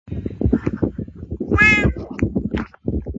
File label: quack